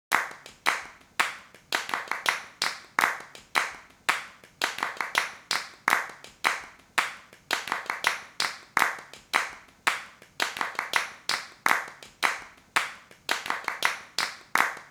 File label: claps